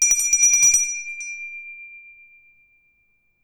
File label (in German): Kongorei; Instrumentensammlung